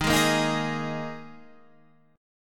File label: Eb Suspended 4th Eb Guitar Standard; Ebsus4 strummed; JGuitar